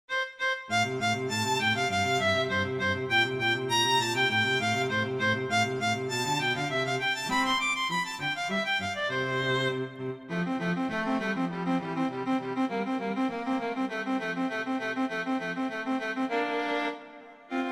Rondo, Allegretto; Mazas; Duets; Duet 8

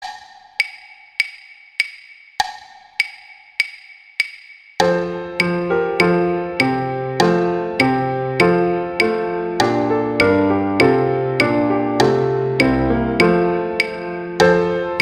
Deck the Halls; Christmas

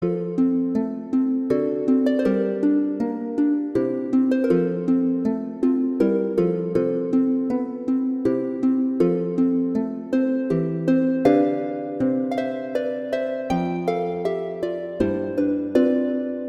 Sonata, Andante; Dussek; Sonatas

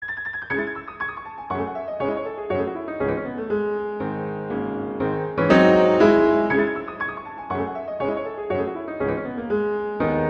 C sharp minor, Allegro molto; Chopin; Preludes

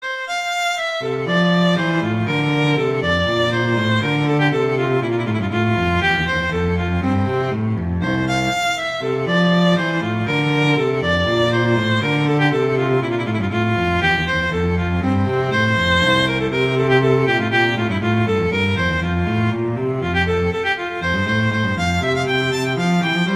Allegro; Telemann; Canonic; Sonata 1

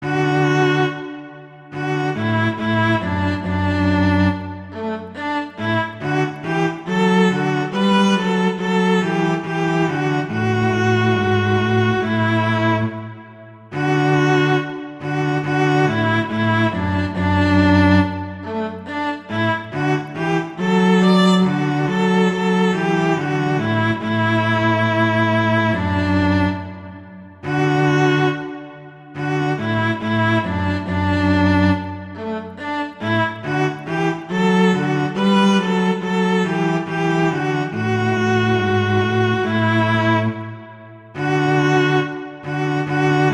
Duet; Mozart; Duets; Vla

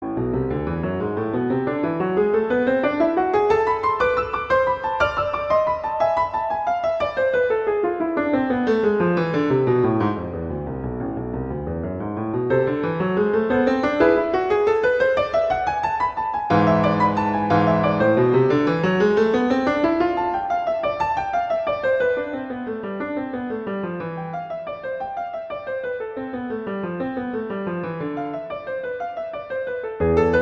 Exercise, A minor; Clementi; Preludes

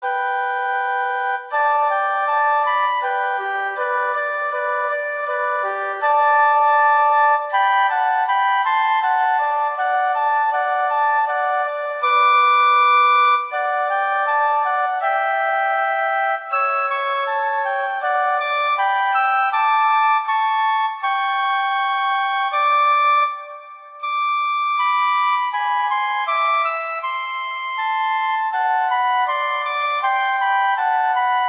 Duet; Mozart; Duets

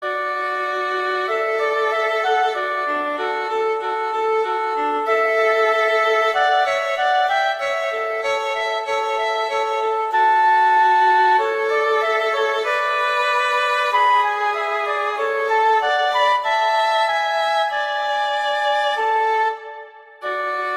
Duet; Mozart; Duets

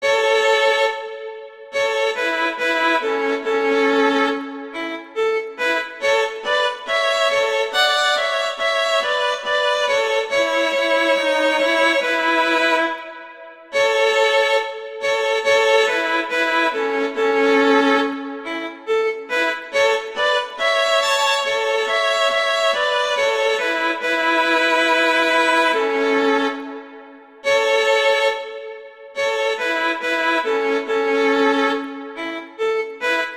Duet; Mozart; Duets; Vla

